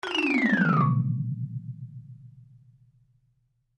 Xylophone Descend, Xylophone Descend Fast Long Low; Mallet Sound Effects; Musical; Cartoon